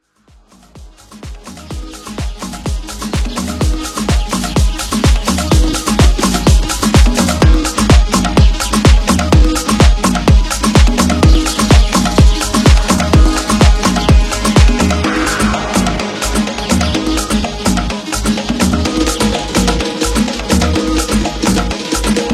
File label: Animal Voices tribal Redrum 126Bpm